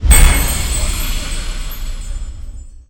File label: Pickup Magic; SFX Kit; Pickups